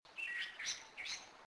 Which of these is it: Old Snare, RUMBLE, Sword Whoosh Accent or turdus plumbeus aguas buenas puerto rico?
turdus plumbeus aguas buenas puerto rico